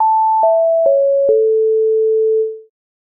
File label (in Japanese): お知らせ・終わり; 戏剧音效